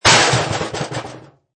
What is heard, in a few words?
Impact Garbage Can, Garbage can or trash can thrown; Garbage can or trash can thrown, impacts with a crash and rattle; Metal; Lynne Music